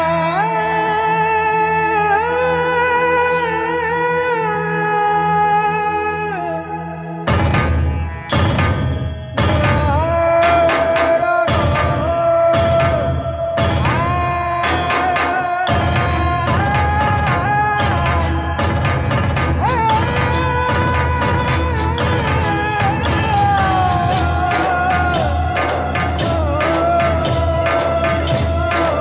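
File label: Background Music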